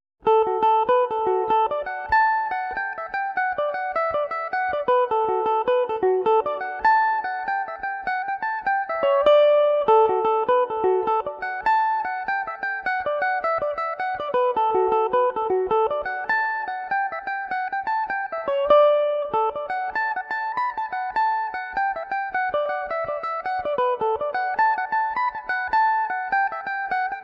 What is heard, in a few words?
john henrys jig dmaj, mandolin